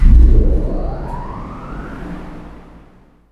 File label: power up